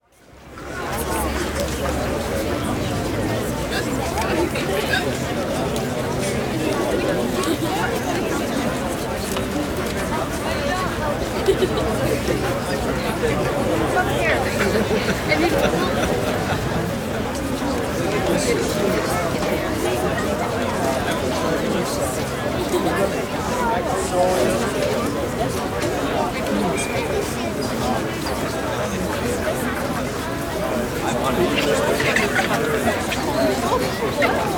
Crowd Outdoor Near Busy Sidewalk Cafe, Walla; Sound Ideas; Walla Series

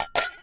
Hit Glass Jar Clink; SFX Kit; Foley - Misc

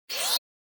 Servo Movement Small Fast; Hollywood Edge; sound designer's toolkit v2

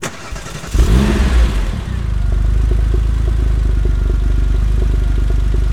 Porsche 911T Start Loop; Automobiles

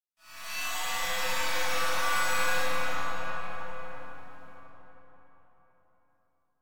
Stinger Metallic Musical Cluster Wash; Hollywood Edge; sound designer's toolkit v2

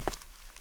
concrete; blindside

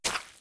boots mud; Apotheorasis

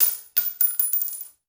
Bullet Shell; Sound Ideas; Guns

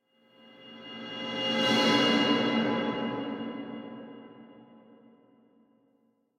Drone Metallic Transition Tone; Hollywood Edge; sound designer's toolkit v2

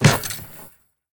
Street Ball Dunk Chain; SFX Kit

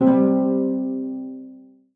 PUZZLE Success Harp Two Note Deep Wet; stereo; Universal Sound FX

